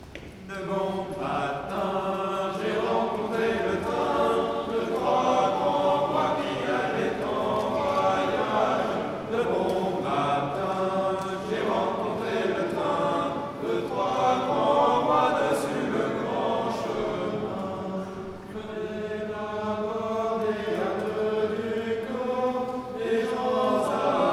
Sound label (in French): La marche des rois Chorale de la Collegiale; Lully; Noyon; Saint Andre; La Marche des rois, ou La marche des Rois mages, ou encore, en provençal, La Marcho di Rèi